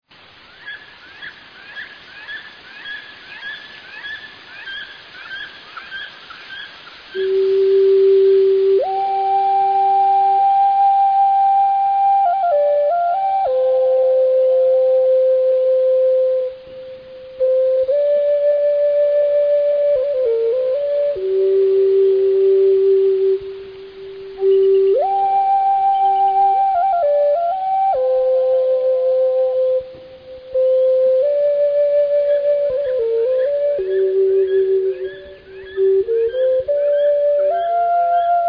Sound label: eagles cry